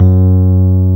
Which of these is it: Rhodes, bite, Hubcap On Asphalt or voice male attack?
Rhodes